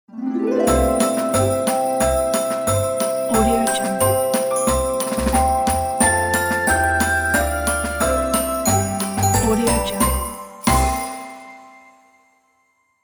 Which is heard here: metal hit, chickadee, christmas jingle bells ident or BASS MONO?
christmas jingle bells ident